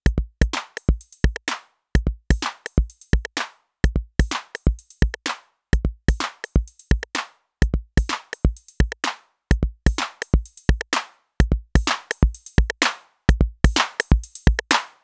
compressor drive transient

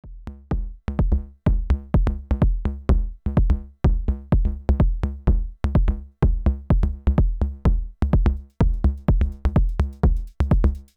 Techno

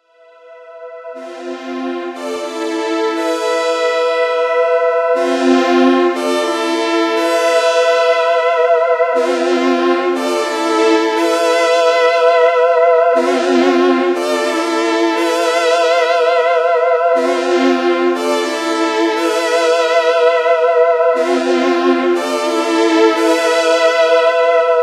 Pad

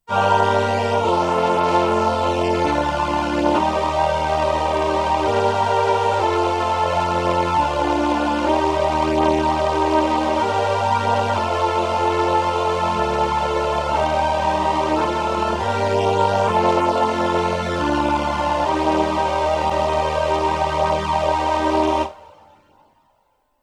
Strings Voice

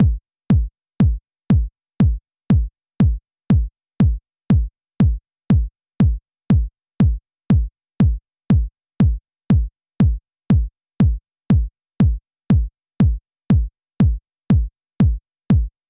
Four to the Floor Kick

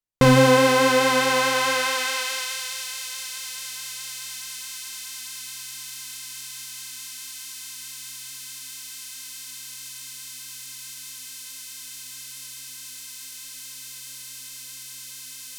toraiz samples filter hpf

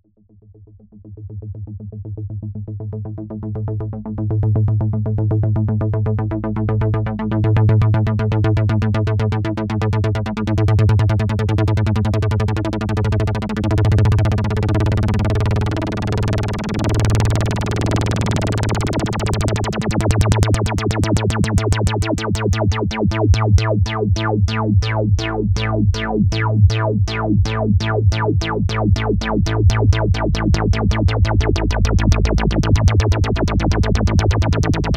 Roland SH 01A Bass

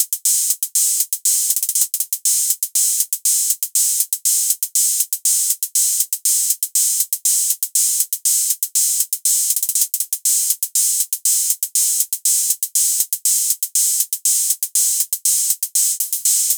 Roland TR 08 Hats

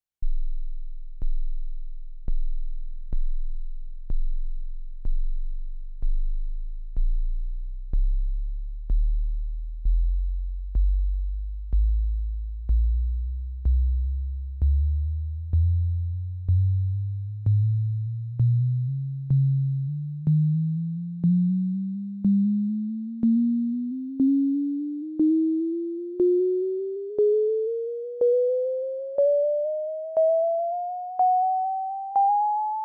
Korg Volcadrum Sine Pitch

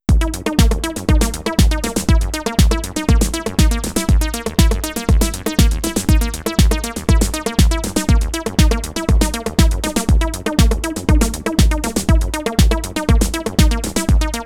Acid Pattern

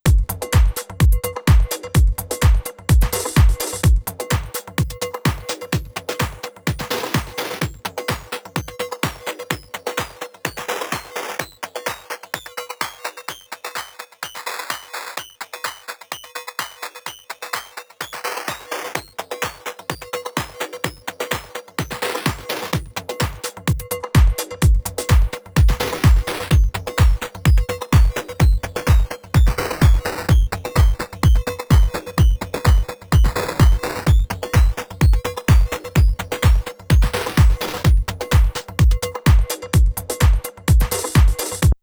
Bitcrusher

